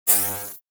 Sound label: Electric dis hum